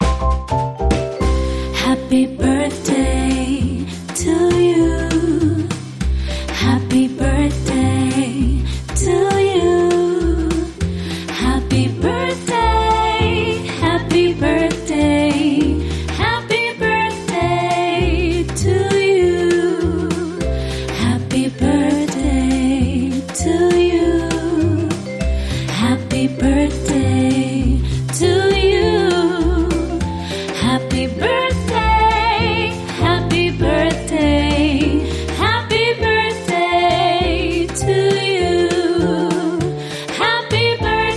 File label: Female Vocal Jazz Happy Birthday